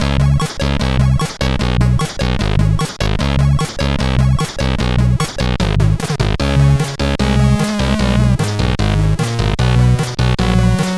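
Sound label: MOS 8580 SID chip music, games; CSG8580R5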